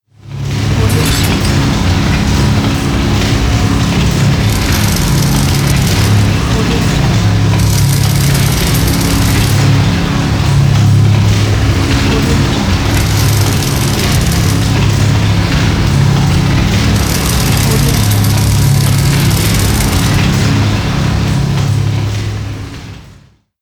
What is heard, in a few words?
industry background